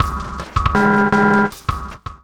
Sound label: Morph Bumpers, Fx; Sonic Foundry (Sony Creative Software); Esoterik Beatz